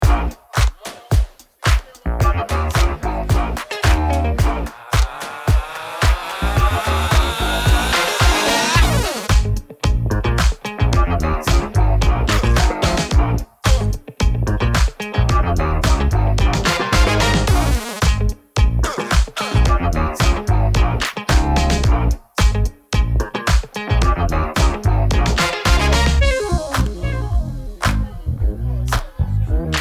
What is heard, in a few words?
trick, passive eq emulation